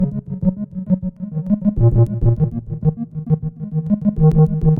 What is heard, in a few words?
Dark Bassy Melody Background Texture; loops